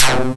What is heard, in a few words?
FX; E-MU Formula 4000 Series Vol. 3 – Analog Odyssey; VK+ Instruments